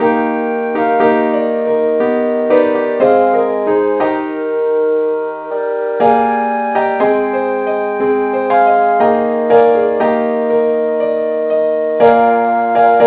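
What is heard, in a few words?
keyboard key A flat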